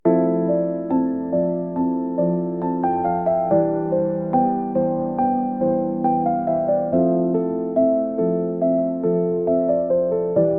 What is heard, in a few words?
Urban Music Soiree, bgm